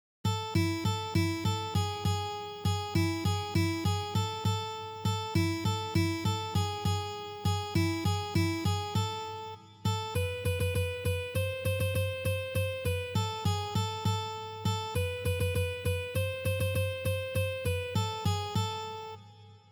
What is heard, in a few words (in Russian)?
na odnoj strune